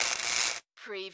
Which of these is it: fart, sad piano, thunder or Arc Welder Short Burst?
Arc Welder Short Burst